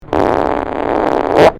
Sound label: fart